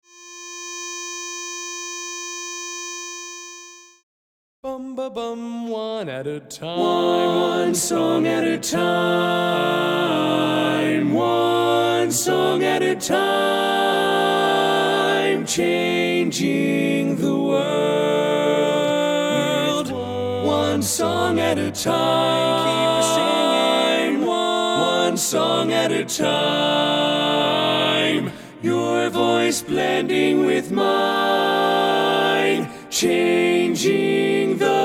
Tenor Left, Learning Tracks

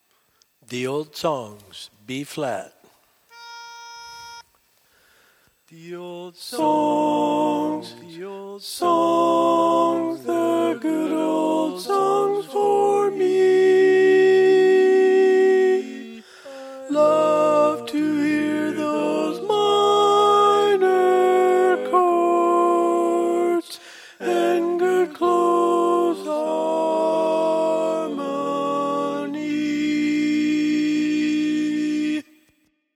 Tenor, Learning Tracks

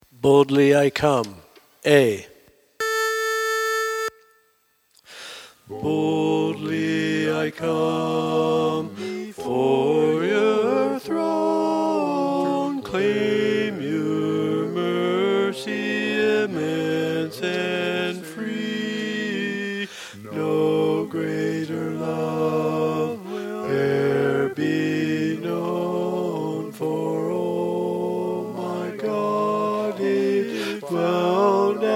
Quartet Bari

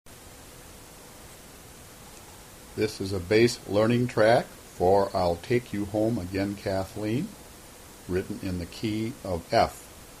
Bass, Learning Tracks

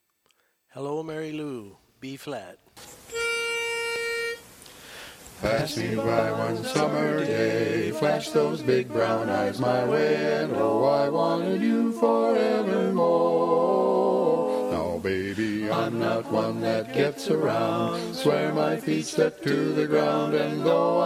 4-part Bass

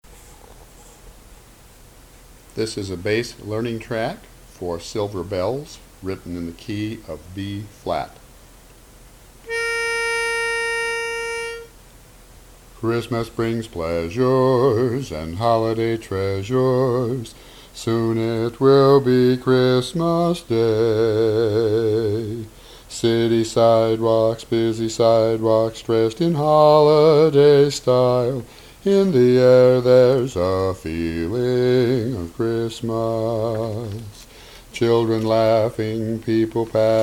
Bass

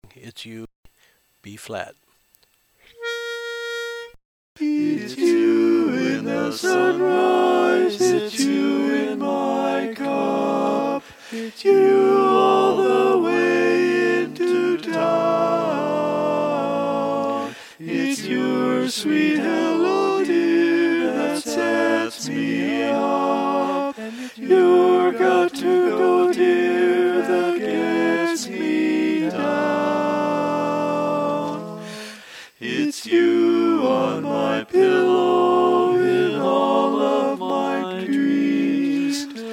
It's You Tenor

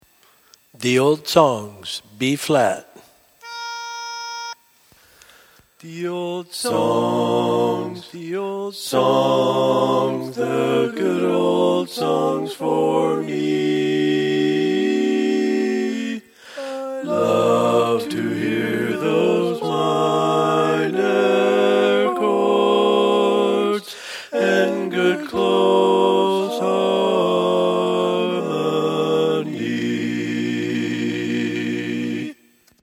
Bass, Learning Tracks

